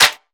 Ice Clap, Dubstep; Ghosthack - AC2024 - Day 13 - Dubstep Sounds 2025

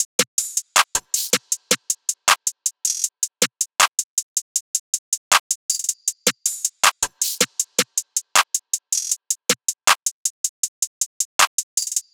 Hihat Loop 158 BPM; Drum Loops; Magic City Hihat Loop; Cymatics; Cymatics - King Drum Loops (Teaser)

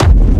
Techno Kick; 30 Techno Kicks 2025; Ghosthack

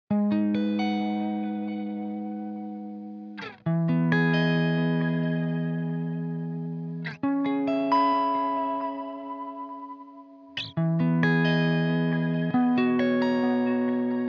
135 BPM G# Min Hi Guitar; Loop Stems; Cymatics; Reasons

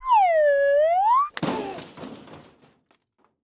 human burping; Cartoon - film effects

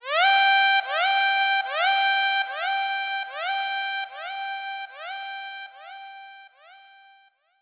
FX Siren 74 BPM; Drums; Cymatics

